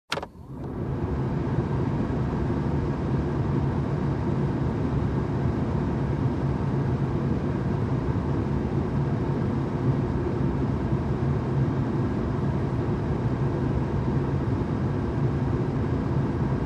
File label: Car AC running